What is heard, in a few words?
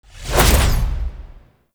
Deployment Cannon Loading Card Jump In; Warframe; Hub; Tau